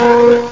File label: warp; lightspeed